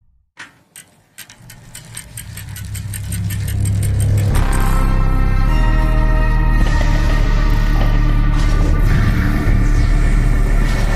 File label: Round Start